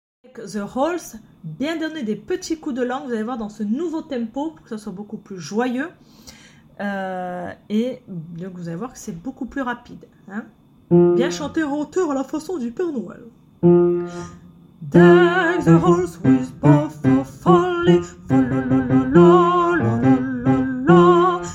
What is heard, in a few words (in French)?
basse nouveau tempo